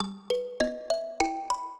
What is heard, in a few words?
minuet, mbira; Mozart